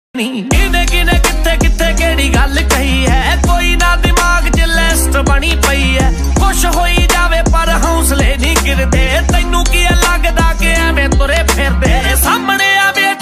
Dance Punjabi Ringtone

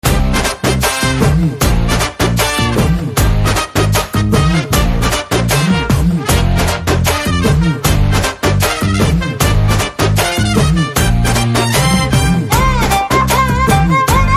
BGM Telugu Ringtone

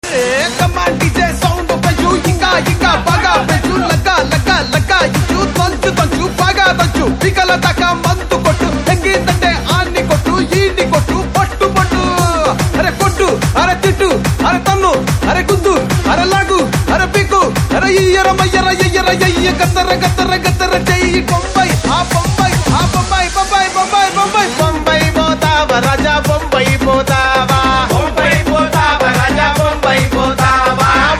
DJ Telugu Ringtone

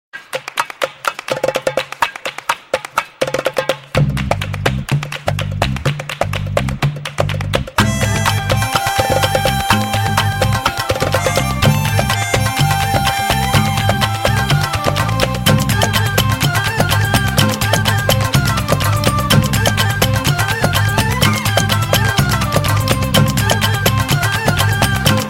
Cool Ringtone; Vodafone

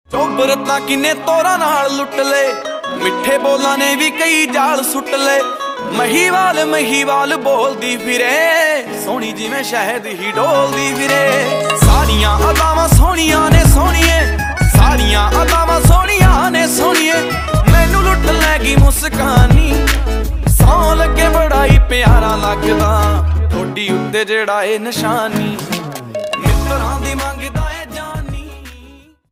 Punjabi Beats

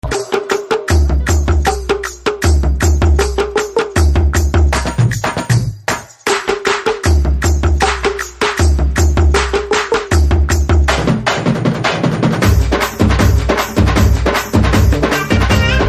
BG Tone Telugu Ringtone

